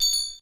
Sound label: drop